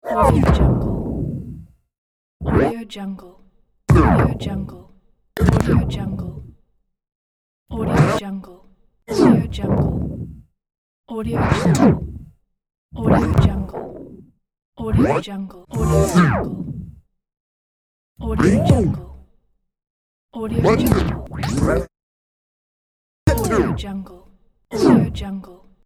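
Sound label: vinyl record abrupt stop sound pack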